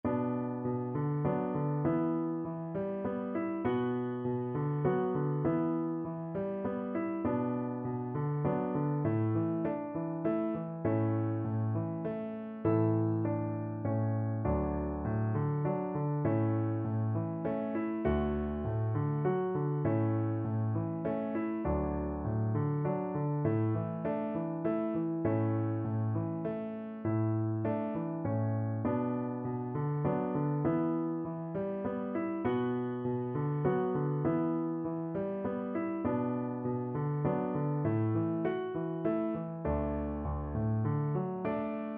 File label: faster